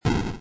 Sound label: fire explode; doublespeakgames